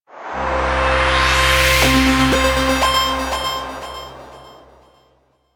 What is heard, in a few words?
Fishing Success Small, Music; Venus; Landscape